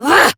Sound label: Exert Adult, Melee Swing; en; New War; Adult Operator